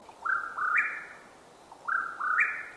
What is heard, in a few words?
Whip-poor-will